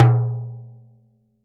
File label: TIMBA, GM drum set; AKAI S-Series CD-ROM Sound Library VOL-8